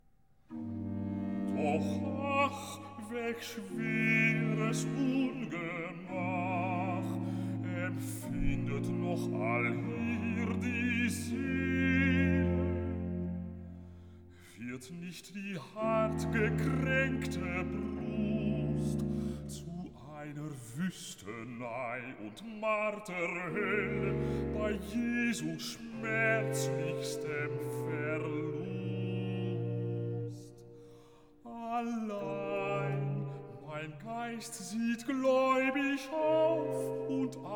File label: Recitativo; Bach Johann Sebastian; Meinem Jesum Laß Ich Nicht, BWV 124; Kantate Epiphanias Plus 1